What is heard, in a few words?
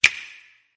Loud; Nintendo Switch